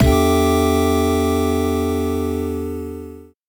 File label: Retry Chiptune Version; Flash